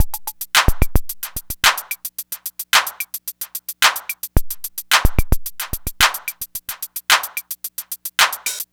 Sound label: NRG Break, Trance, Drums, Breaks